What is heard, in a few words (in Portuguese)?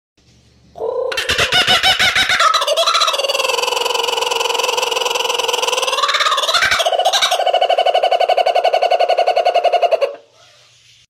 Canto do cucaburra grande